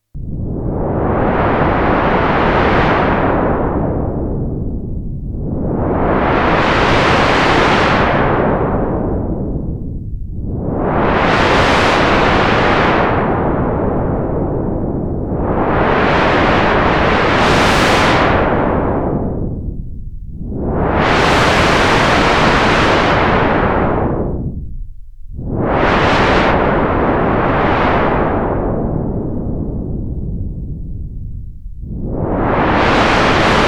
SURF, Roland SH-101; Factory Patches

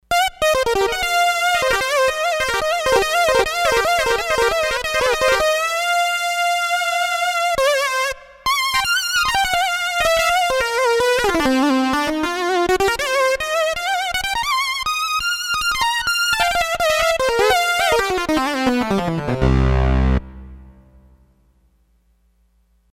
Bright Power, Roland D-10; Factory Patches

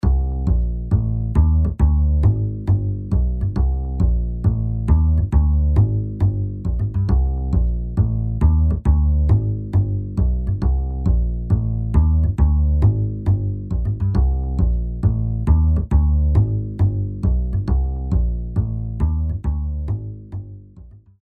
Walking Upright Bass; Apple GarageBand 2; Apple Loops

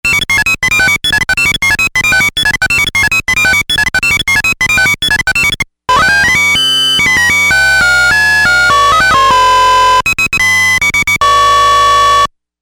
Robotspeak

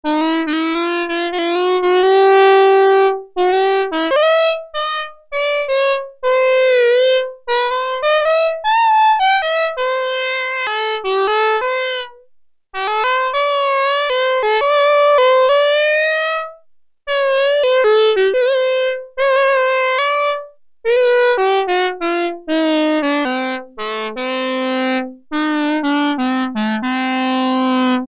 STRIPPER SAX, Oberheim OB-8; Factory Patches

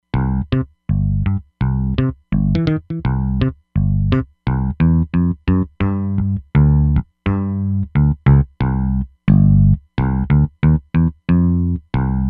Chicken Bass; Roland Fantom XR

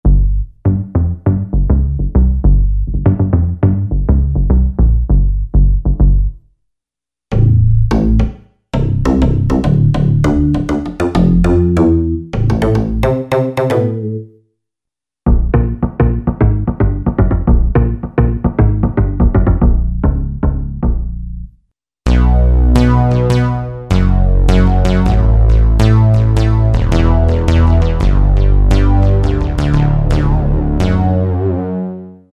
SYNTH Basses; Best Service Gigapack

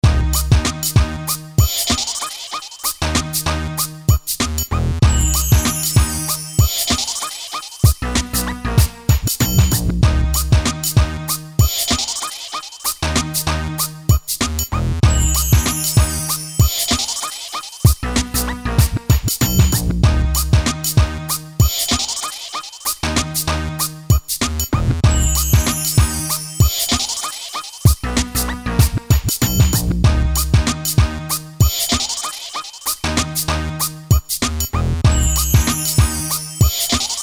Ill Hip Hop, Akai MPC1000

